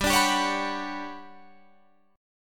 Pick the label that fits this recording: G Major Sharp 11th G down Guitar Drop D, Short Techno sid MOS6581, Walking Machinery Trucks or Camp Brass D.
G Major Sharp 11th G down Guitar Drop D